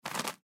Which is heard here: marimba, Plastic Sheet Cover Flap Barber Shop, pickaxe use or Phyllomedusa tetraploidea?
Plastic Sheet Cover Flap Barber Shop